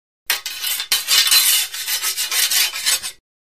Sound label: Swords Metal Shing; clangs